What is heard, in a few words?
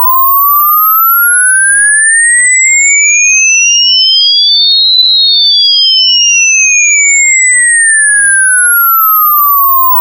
test sweep; Siemens S75